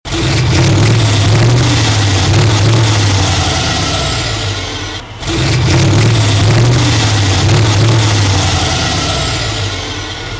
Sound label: truck